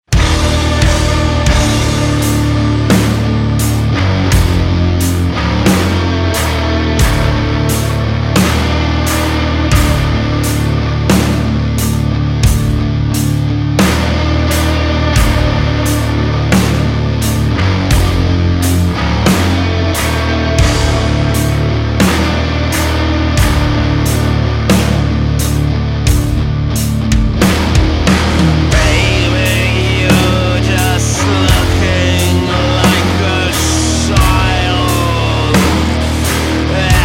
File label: Stoner